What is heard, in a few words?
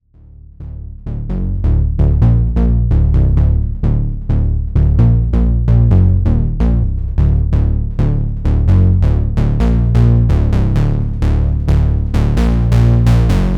Fat Bass